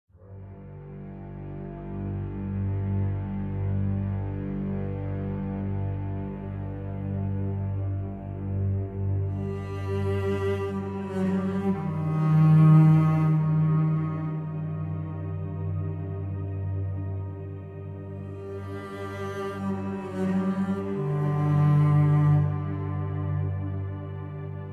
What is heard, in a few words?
Mystic Book without narration; children of morta; Procedural Events